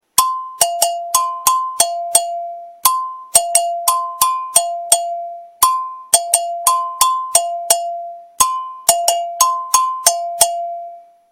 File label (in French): instruments agogo